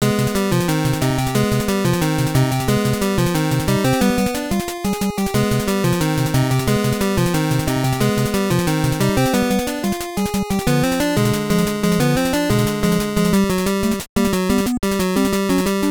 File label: Dungeon Realm Boss, nes; demon sword 1988